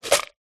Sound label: pebbles many in plastic pot shake toss single; foley